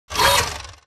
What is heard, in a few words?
Mvmt Servo Light Short; AI Zombie Stalker; Titanfall 2